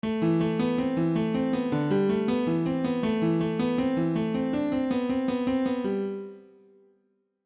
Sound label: Piano Test